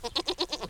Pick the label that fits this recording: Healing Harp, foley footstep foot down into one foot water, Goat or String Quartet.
Goat